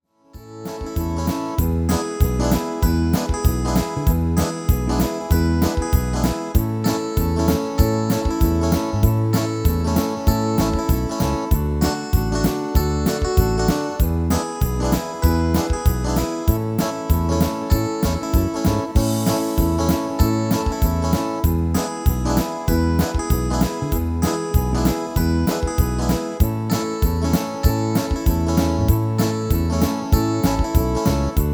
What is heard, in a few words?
MIDI